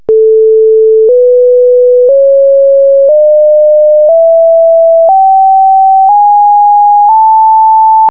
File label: A4 scale